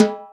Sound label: CONG TOM, CONGA BONGO; Best Service ProSamples vol.54; AKAI